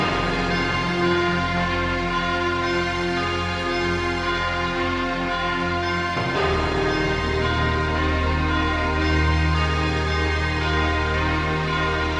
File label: keys, Loops, Sad